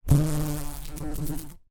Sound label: insect fly buzz around close up